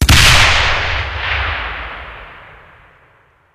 grenadier explode, weapons; tf

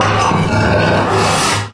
robot arm scrape long; Source Engine; Portal 2

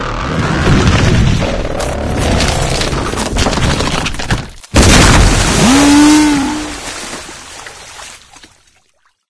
strider reveal; ambient; source engine; ep2; outland

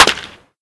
bat baseball hit, weapons; tf

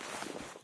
tie fix; taunt; player; video games; source engine; tf

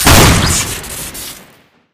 scatter gun double shoot crit, weapons; bonk; tf

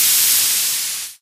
sentry upgrading steam, weapons; tf

